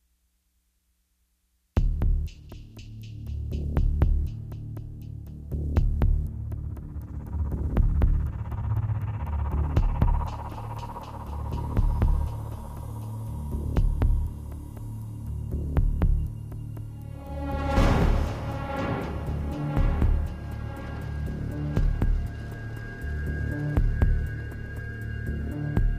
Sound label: Pulsebed Mix